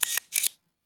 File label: ui window; orbit